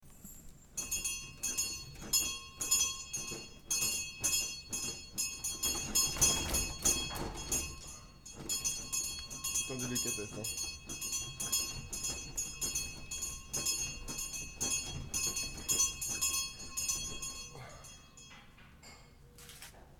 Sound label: school bell